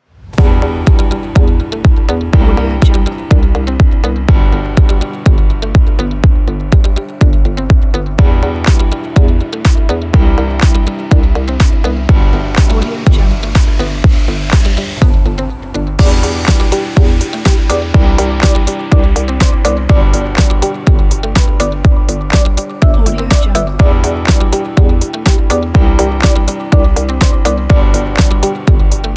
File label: deep house